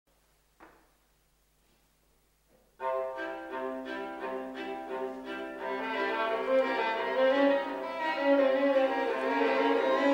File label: trio